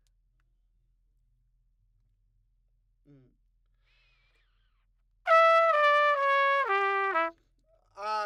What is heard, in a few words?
Trumpet